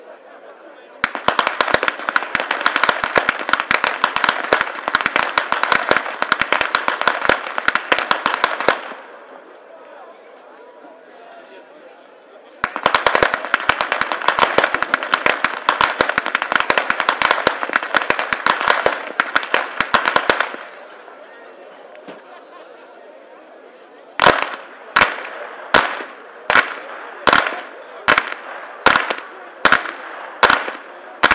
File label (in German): schnalzen, flachgau